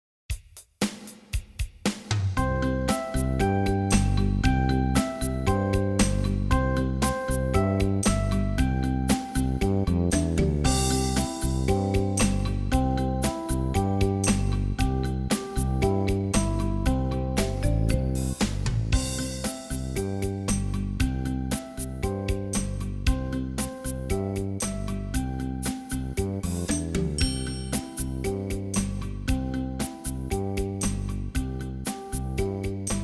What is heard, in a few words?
Warm Up With 3rds, piano